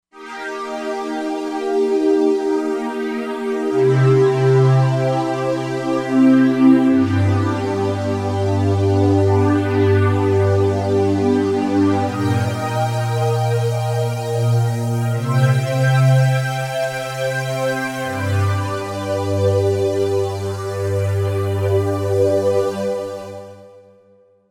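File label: Pad Swirl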